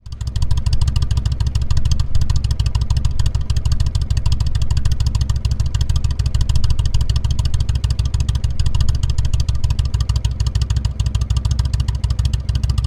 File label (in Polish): indian scout dzwiek ukladu wydechowego slip on na biegu jalowym; Rinehart racing